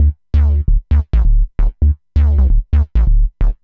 bass, dance

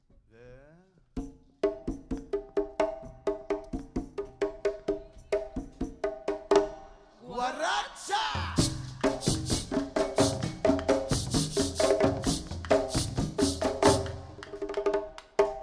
drum Song; Arabic; World Music

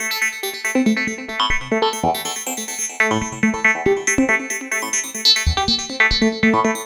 Synth Soul

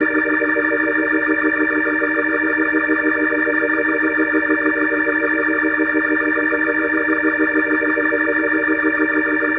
Daddy-O Organ D# Maj7, Organ Licks

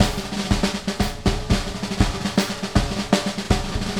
JAZZ TRAP KIT

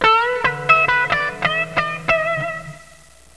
GTR RIFF; MUSIC

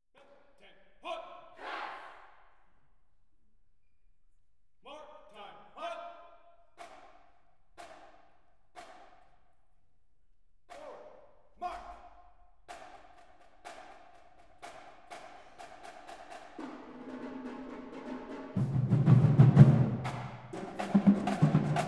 Marching Band Test; World Music; Isomike Super Test 2008A; Audiophile Sound Italia

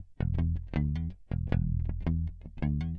Flange Bass; Rock

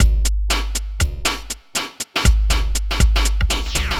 ACID DJ EXPANDER

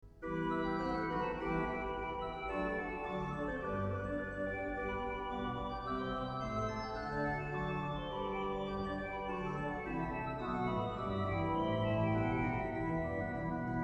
partita vi, Great Organ Works; Bach; Great Organ Works 3

